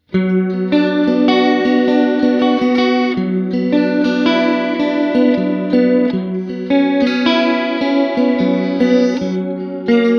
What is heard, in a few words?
Valeton GP 200JR CLASSIC JC 120